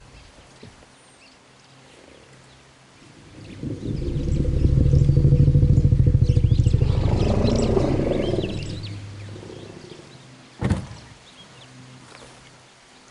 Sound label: elephant call and response